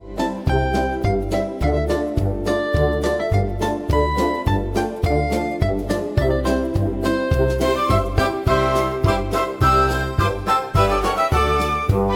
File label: dabble